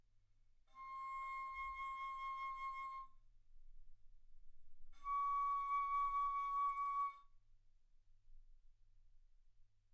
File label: Bass Flute ff C6 Db6